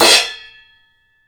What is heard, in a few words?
CHOKE, CRASHES; Sampleheads; New York City Drumworks VOL-1; KD CRASHES